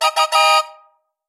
Train Whistle

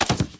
Body Tumble quick; friday the 13th killer puzzle

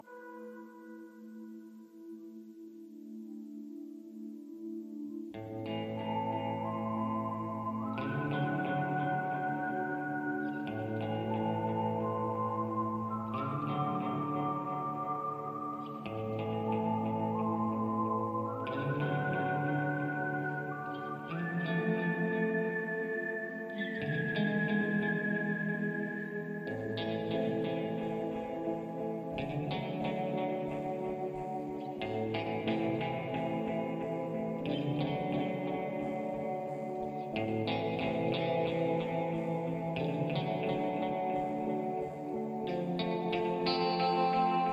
Western Daytime Ambient Loop; Fortnite

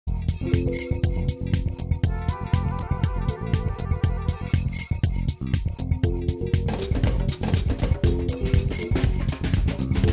Music Menu; coffee shop

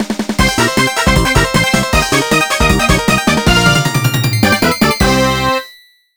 BGM Opening; Sam Power Footballer; NDS

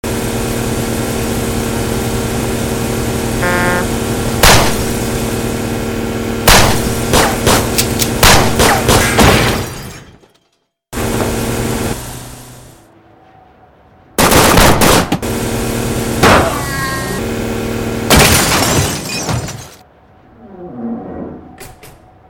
Car Chase; Fleeing The Complex

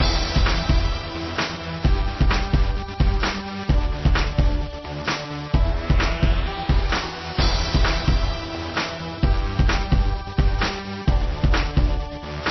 Catacombs Loop; monster high creeptastic catacomb adventures

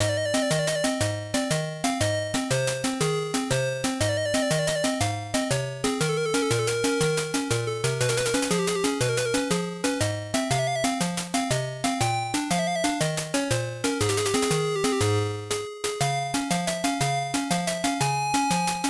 Trombonanza; Circus Charlie; arcade

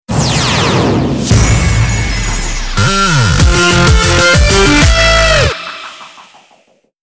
Jackpot Jingle; The Sound of Tekken

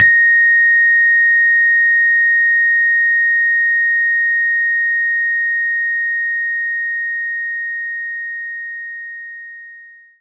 Rhodes Bb5

